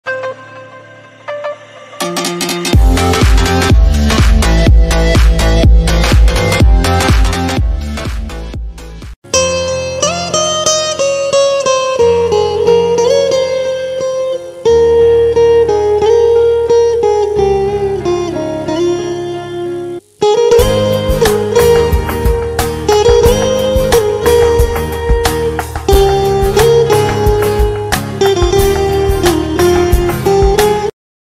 ringtone bgm music; aashiqui 2

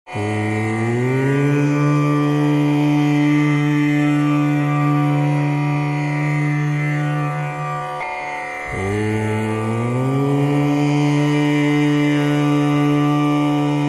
om ringtone

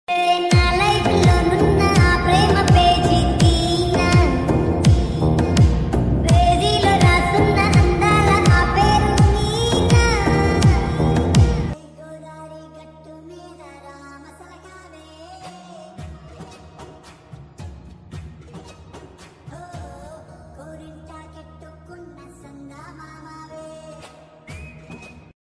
telugu songs; sankranthiki vasthunam

